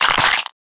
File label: cracking neck bones